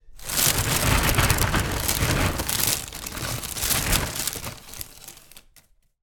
food cereal box hand inside grab cereal shredded wheat